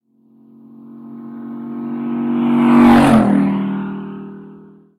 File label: car; Blind Drive